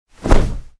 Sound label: cloth blowing